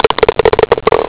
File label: golfball